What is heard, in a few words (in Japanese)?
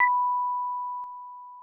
時報